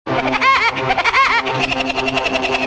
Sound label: haha